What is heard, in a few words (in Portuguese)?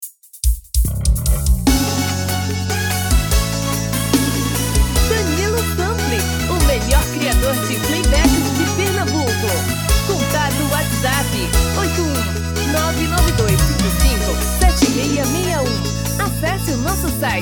tom feminino